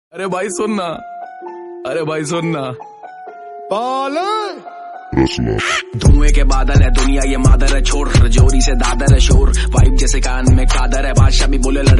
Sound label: Hindi Rap